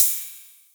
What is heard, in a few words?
128BPM Kit solo; Shockwave(PIL) - Future Big Room vol 2; Massive Kit